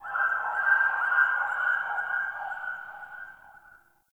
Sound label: Day Distant Creature; Ambience; One Offs; Glades Of Andurin